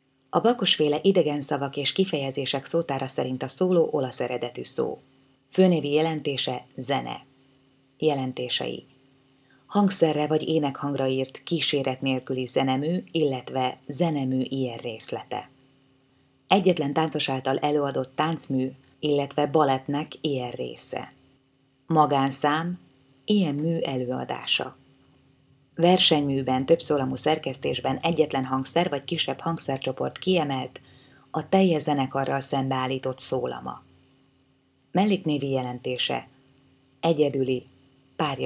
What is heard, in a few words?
solo